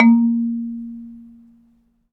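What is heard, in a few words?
MARIMBA C6; Synclavier Percussion; World & Orchestral; ILIO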